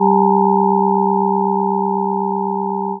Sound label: chord cubic products